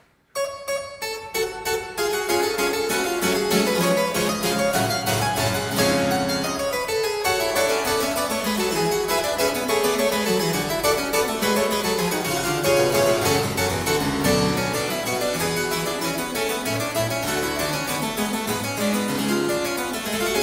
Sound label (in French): suite anglaise pour clavecin, allegro; BACH; bwv 808